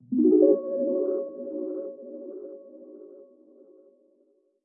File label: ACCENT, 95 BPM B Maj; Ear Candy; Color; Cymatics; GHOST (Beta Pack)